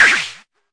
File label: mbrute sn, Arturia MiniBrute; DRUM MACHINES